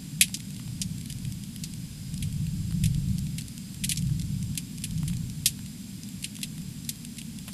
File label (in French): feu mix